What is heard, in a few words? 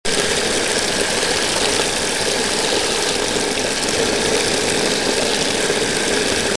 waterfall; cydonia